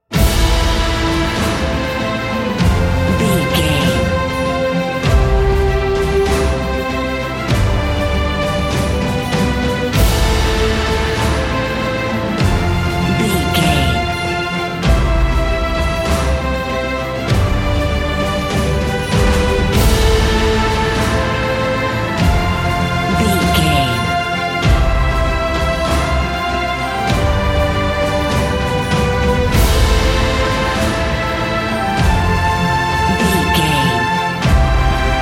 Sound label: cinematic corporate